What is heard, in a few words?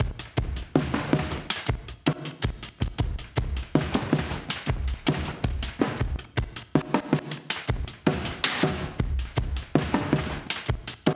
160 Drum Fx